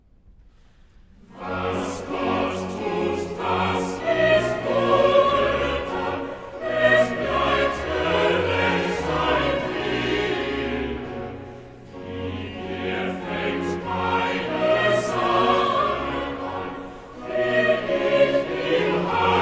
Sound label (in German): Choral; Cantata, BWV 144 'Nimm, was dein ist, und gehe hin'; Bach; Naarden; Septuagesima